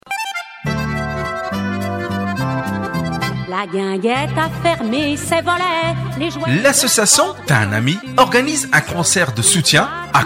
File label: Jingle